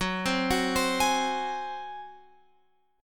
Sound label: F sharp Suspended 2nd Flat 5th, Guitar, Open F; arpeggiated; x,13,12,13,15,15